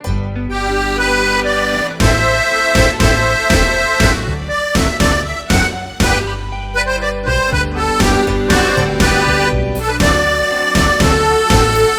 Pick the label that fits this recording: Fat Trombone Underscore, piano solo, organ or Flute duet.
organ